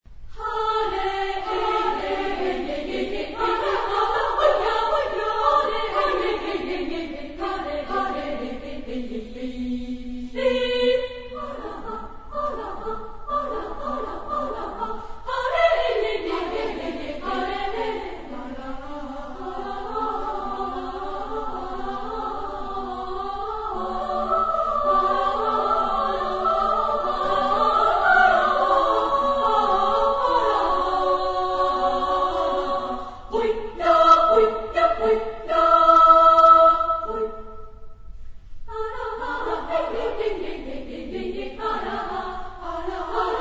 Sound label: female; Fukushima; Nantoo uta asobi; chorus